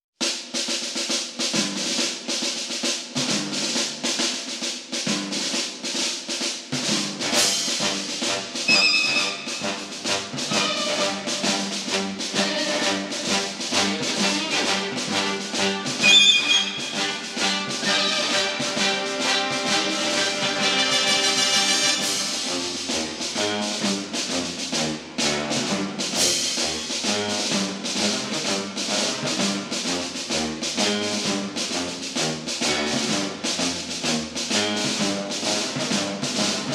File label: Orch Army Advancing; the movies 2005; Clean Silent